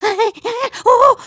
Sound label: freak out, emotes